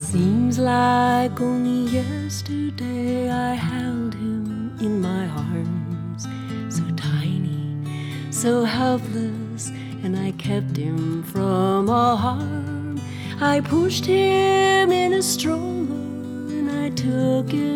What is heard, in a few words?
folk clean